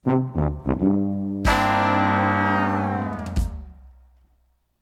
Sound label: fail horns